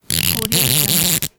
pullstring windup

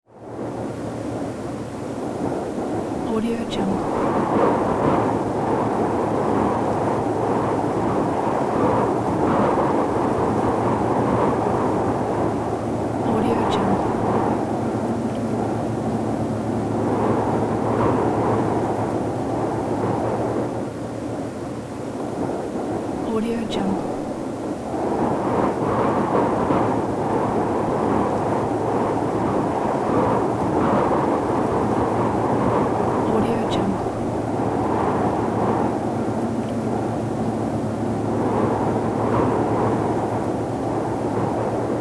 wind gusts soft whistling distant humming

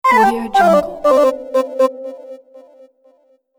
elegant game over

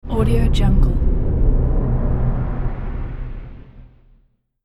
dark air transition

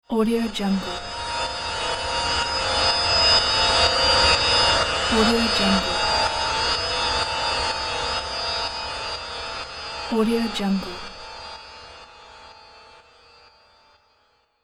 spell of magic transition

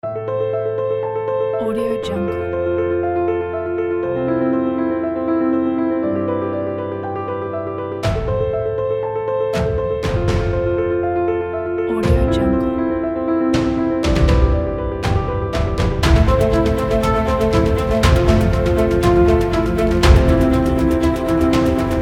freedom kit

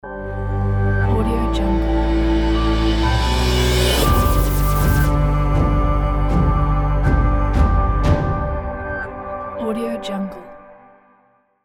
rising stars ident

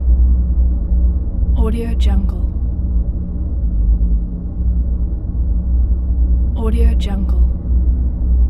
spaceship corridor loop; justindevern

